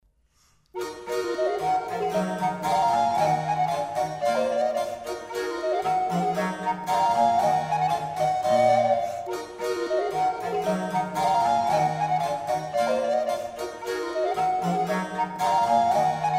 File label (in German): Suitte e moll fuer 2 Voice Flutes und B. c., Rigodon